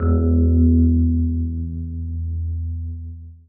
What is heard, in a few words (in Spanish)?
Piano Agudo